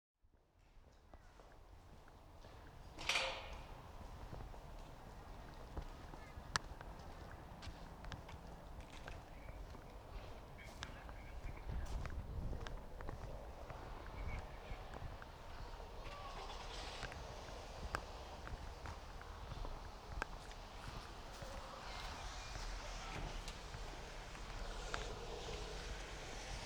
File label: Mount Gravatt hike, Australia; Les Cartes Postales Sonores; NOISE ON EARTH Vol7